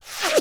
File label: Bullet Whiz By; Sony Pictures Sound Effects Series; Ricochets - Hits - Whiz Bys